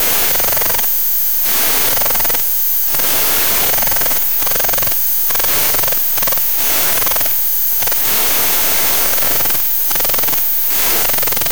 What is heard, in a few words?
the sound of searching fo, radio tuning